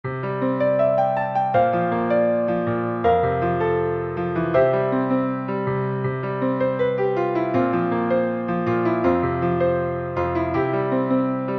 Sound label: Ostinato